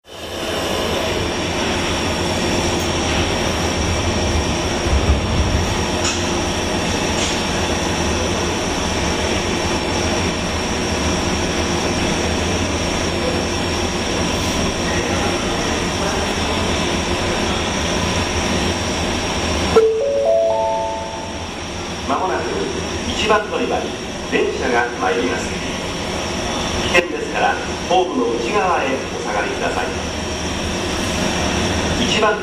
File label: tokyo subway; japan